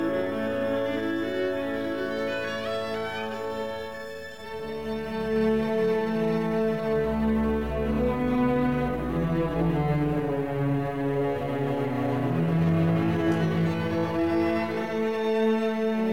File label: viola; gaskell